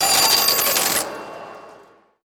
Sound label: case multicast